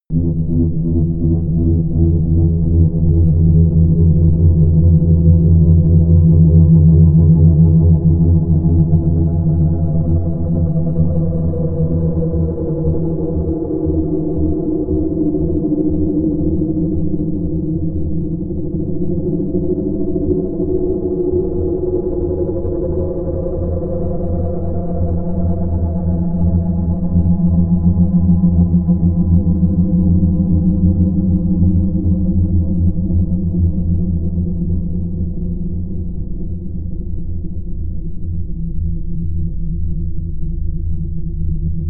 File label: drone calm growling